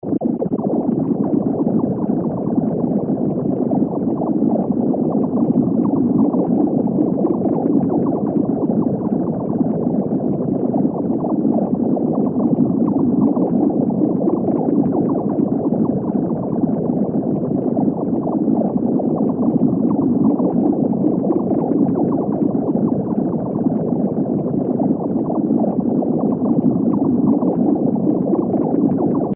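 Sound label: liquid bubbling layer smooth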